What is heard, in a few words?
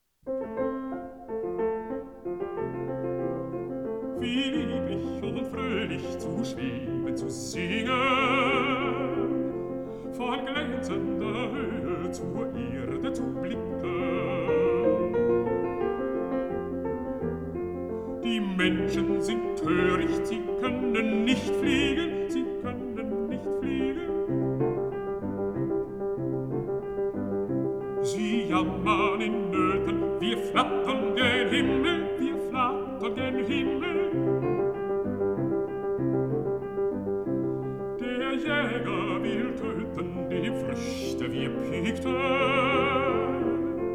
song for voice & piano